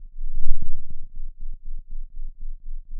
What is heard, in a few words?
pop, Sound of a thunderstorm